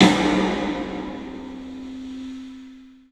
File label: PA DEEP L, CLUB SN VRB; 3D Sound; 3D Drums